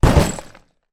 foley cardboard box impact containing plastic lego and toys; zapsplat